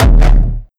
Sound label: Kick Dist Techno; Leonard Blaesing